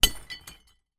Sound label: impact glass bottle throw onto broken glass debris